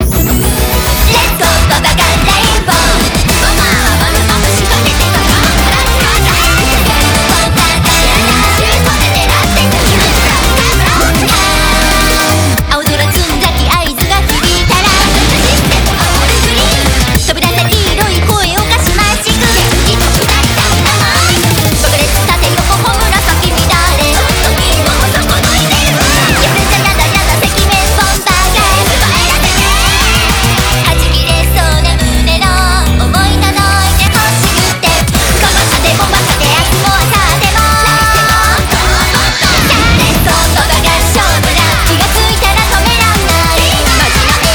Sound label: BGM Reach